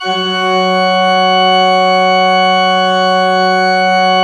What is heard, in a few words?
F2, Cathedral Organ, MANUAL PLENO; Propeller Island